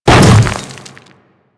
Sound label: Hammer Boss Step Dirt, Footsteps; Warframe; Grineer